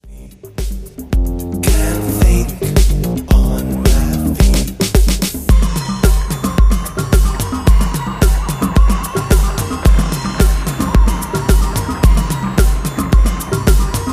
tiny little drum

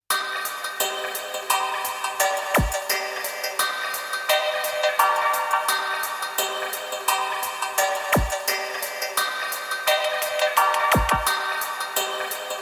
Dubbing Siren

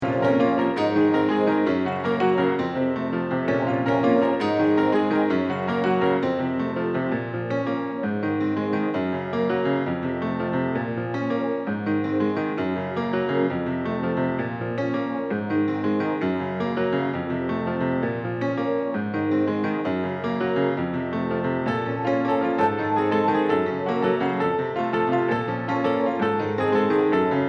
The Kick Off (Piano Mix)

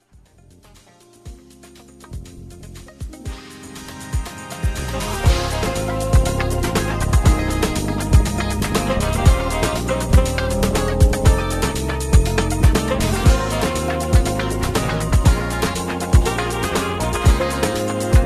afros arps & minimoogs

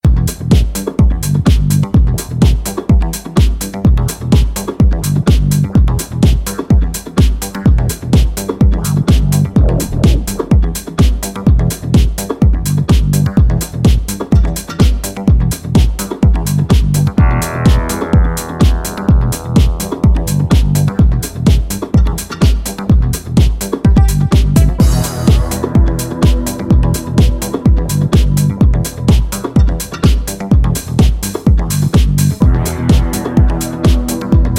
Pluck & Growl

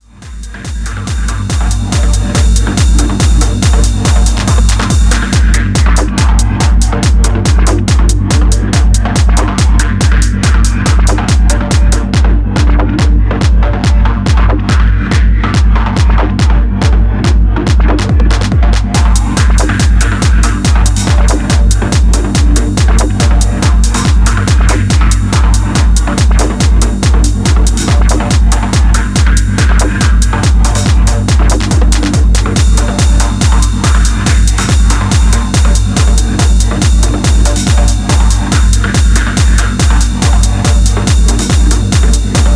Clap Trap

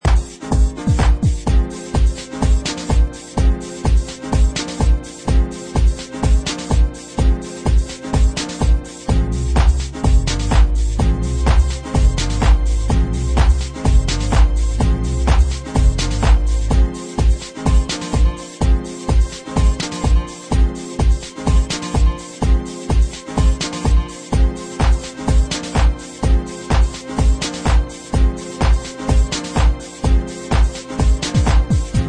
acid techno

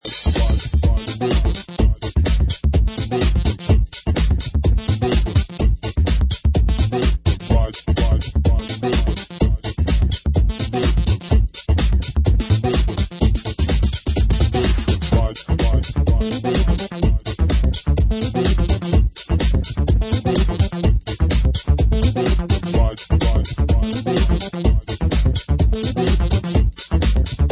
accapella

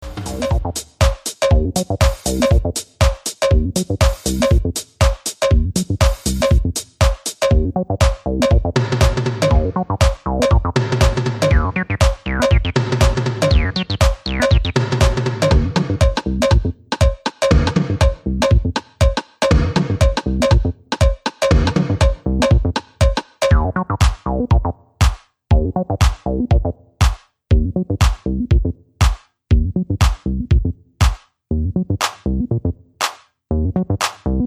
(acid dub); City Fly records

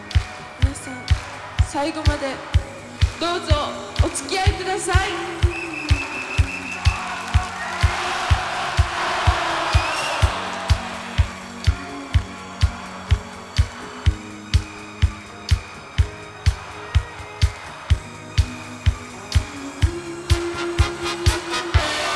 125 BPM

